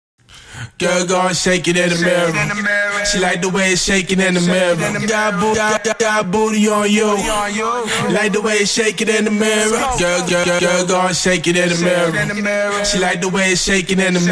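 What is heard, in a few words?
hip hop 196 Abm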